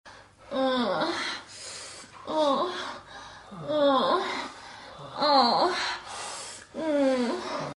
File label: jerk, moans; The Witcher Hunt